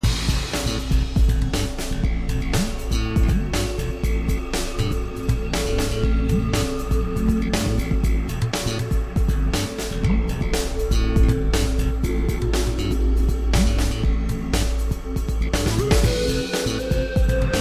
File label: battle